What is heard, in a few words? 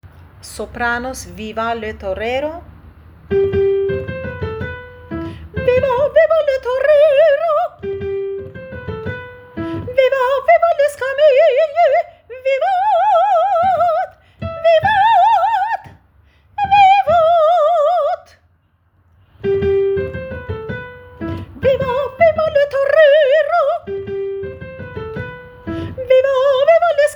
sopranos